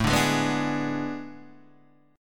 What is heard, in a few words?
A Major 7th Suspended 2nd Suspended 4th, Guitar, Open D